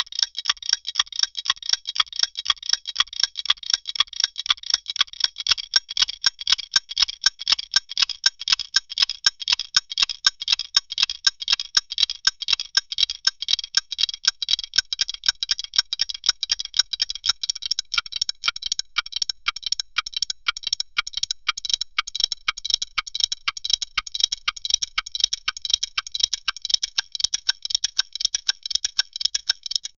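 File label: anxiety techno timer click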